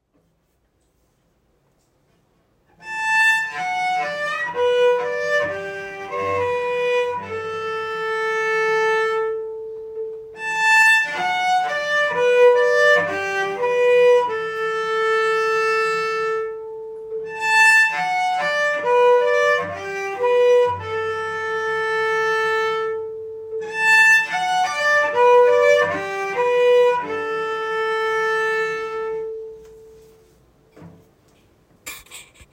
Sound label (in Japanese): 強めハイポジ, cello